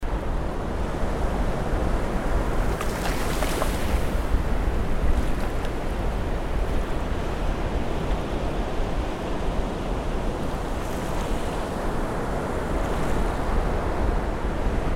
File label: Edge of Ocean